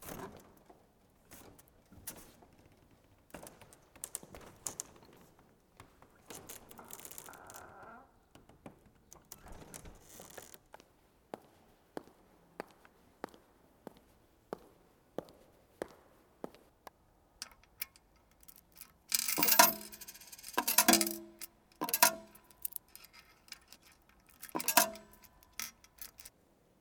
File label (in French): église